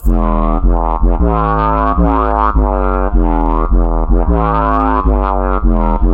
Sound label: the bass